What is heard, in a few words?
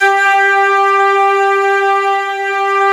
ST ORG, STRINGORGAN, Yamaha VP-1